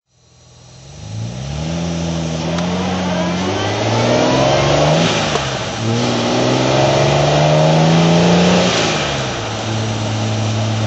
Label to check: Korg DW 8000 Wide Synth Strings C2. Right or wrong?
Wrong